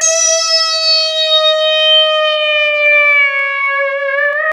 lead (brass) bend; Sonic Foundry (Sony Creative Software); Ugly Remnants VOL-2; Leads

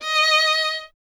STR VLN, Vln Detache; Miroslav Vitous

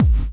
BD; Best Service; Dance Mega Drums

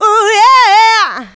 Vocals, Adlibs; Houseworx

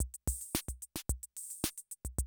CR78, DrumLoops

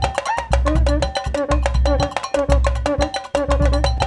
RIO, Rio Batucada

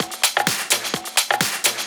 Clubhouse Loop 128 BPM

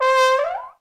TROM DOIKS

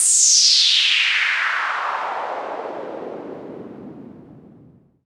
fx reverse; Ueberschall; Techno Trance Essentials

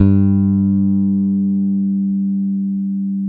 FBASS, Electric Basses

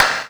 DS4 clap; E-MU Formula 4000 Series Vol. 2; OrbitPercMaster

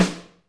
XE8 SNR, drum; Crypton - DrumBase5000

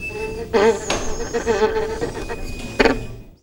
VIO MISC, MISC VIOLINS

